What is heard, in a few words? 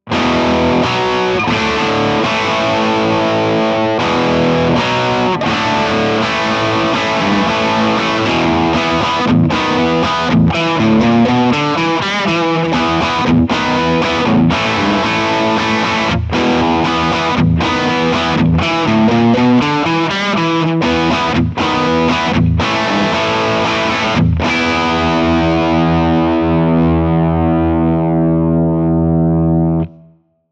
rock chords; jensentone